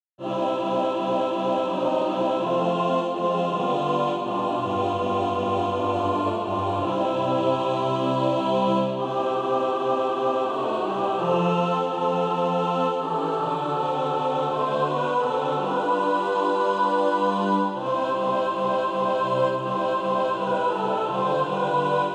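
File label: mixed, SATB Practice Music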